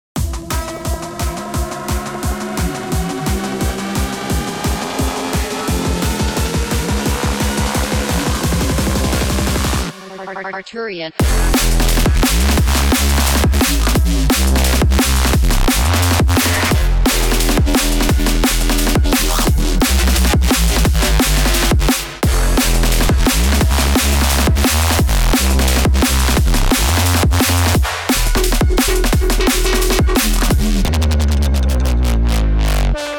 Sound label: neuro bass; wavelengths neuro bass